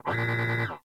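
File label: Research Facility Repair Robot Lift; Warframe; Venus Open World; Objective Rooms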